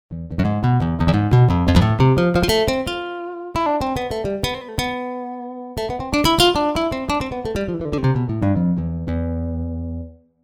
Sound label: Flamenco; TURBO VL